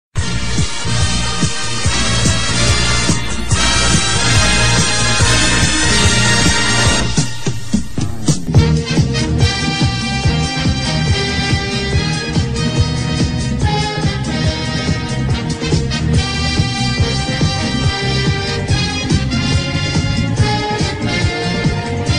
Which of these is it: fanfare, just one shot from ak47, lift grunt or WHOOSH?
fanfare